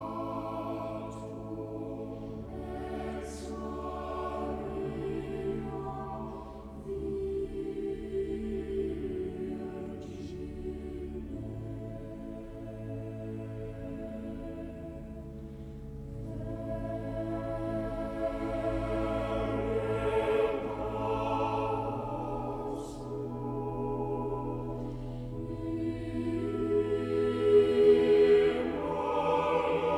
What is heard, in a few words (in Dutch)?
koor orgel; Mozart; Ave Verum corpus